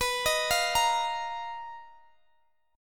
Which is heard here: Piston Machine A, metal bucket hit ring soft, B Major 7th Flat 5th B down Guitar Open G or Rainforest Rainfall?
B Major 7th Flat 5th B down Guitar Open G